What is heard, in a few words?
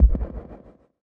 Perc, drums; Touch Loops; BlissedOutBeats